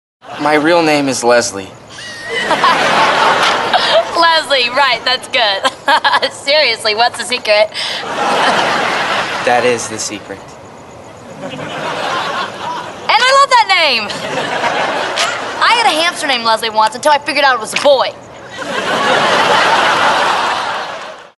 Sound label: leslie